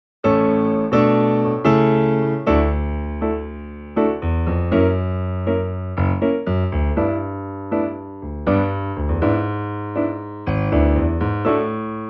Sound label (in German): sopran; Sing Human Rights – Marktoberdorf 2025